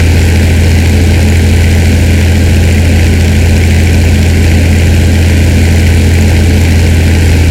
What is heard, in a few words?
stuka rear, engines; War Thunder